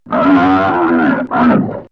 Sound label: Fear Scream Of Spirits; vox mix; X-Static Goldmine